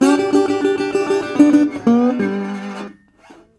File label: 120, Blues Guitar; ILIO - Fretworks